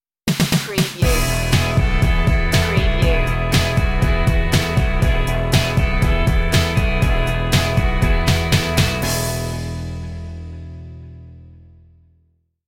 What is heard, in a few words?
in the wind stinger